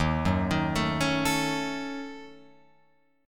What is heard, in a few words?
D sharp Minor 7th Flat 5th down Guitar Open F; arpeggiated